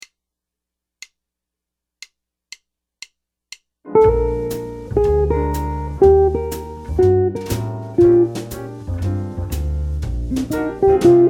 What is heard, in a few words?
lick, jazz guitar